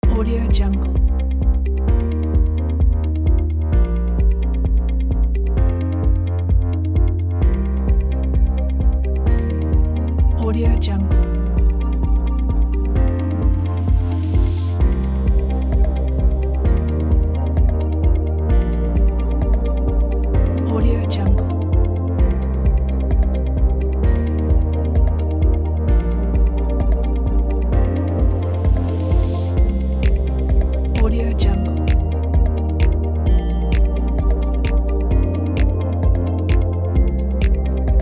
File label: summer upbeat corporate motivational inspiring